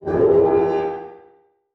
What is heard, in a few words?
Transition Whoosh Medium; Production Element